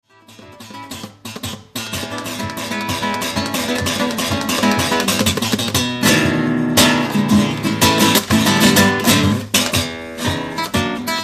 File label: blues